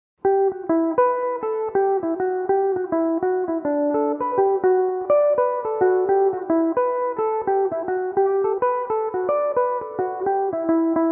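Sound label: jig, E minor, mandolin; boxwood jig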